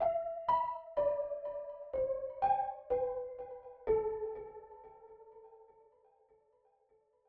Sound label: piano melody